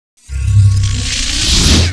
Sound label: Beam On; Phoenix